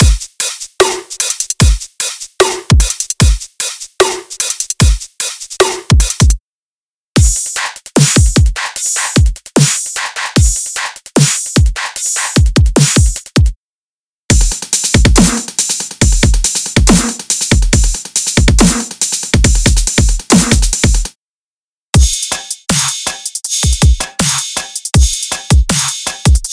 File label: Dubstep